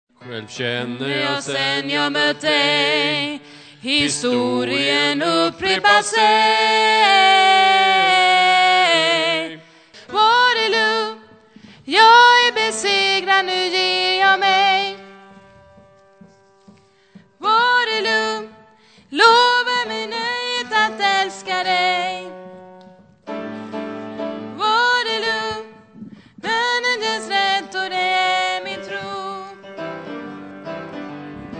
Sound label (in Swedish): bas, stämmor